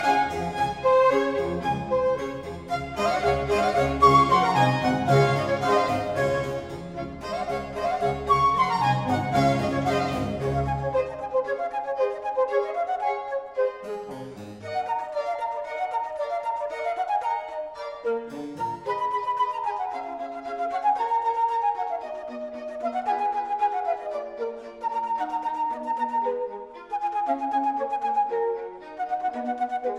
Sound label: Baroque Flute Concerto in G Allegro; Neil McLaren